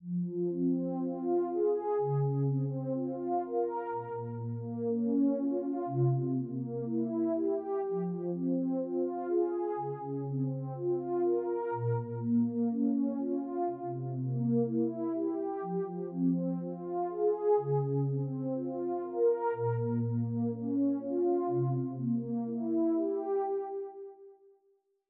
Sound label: 123 BPM F Min Synth; Melody Loops; Loop Stems; Hip Hop; Cymatics; Vuelve